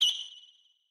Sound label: land bird; smite; chaac